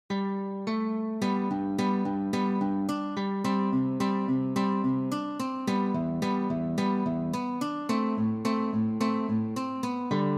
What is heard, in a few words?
Picking